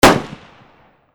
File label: 308 caliber rifle